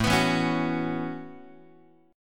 A 6th Add 9th A down Guitar; A6add9; strummed

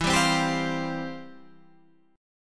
F Major 7th Suspended 2nd, E, guitar

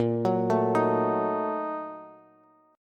Bb Major 7th Suspended 4th Sharp 5th, Bass; arpeggiated

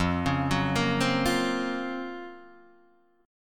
F sharp Major Sharp 11th F

